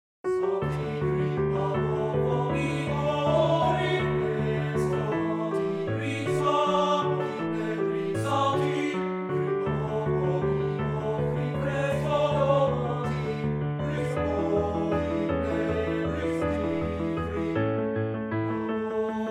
Lied per voce e pianoforte; Schmittbaur; Provisorlied

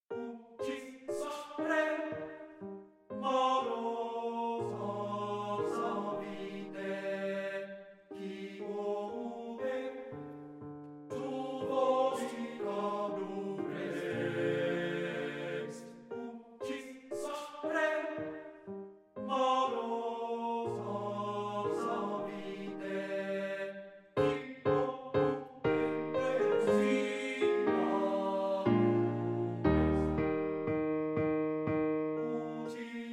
Lied per voce e pianoforte; Rosetti; Kriegenlied

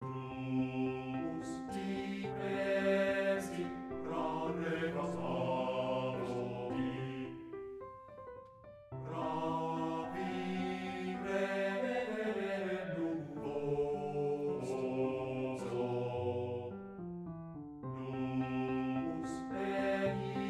lied per voce e pianoforte; Rudolph; Die Verganglichkeit

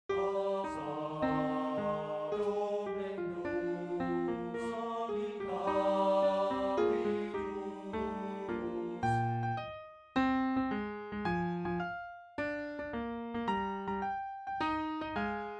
Lied per voce e pianoforte; Schmittbaur; Die Zeit

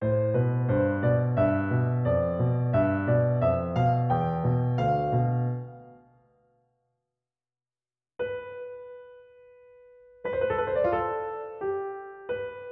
Frammento forse per pianoforte

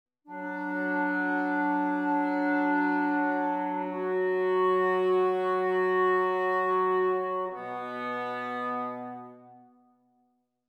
Trio forse per una sinfonia

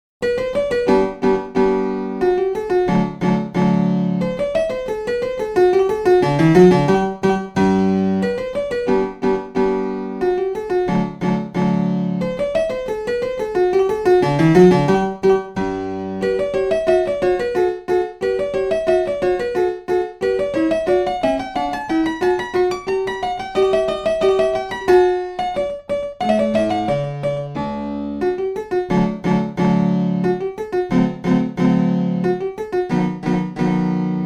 Menuetto per pianoforte Un poco Allegro; Schimittbaur

